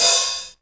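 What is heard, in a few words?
hi-hat open; AKAI S6000 CD-ROM - Volume 1; DRUM RYTHM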